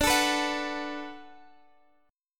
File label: D 6th D down, chord; D6 strummed